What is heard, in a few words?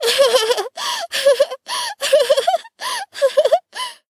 Laugh Long